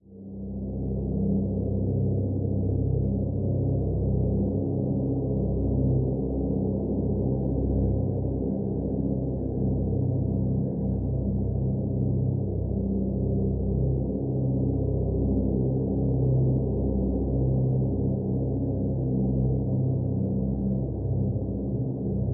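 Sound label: Drone Wind Farm Simple C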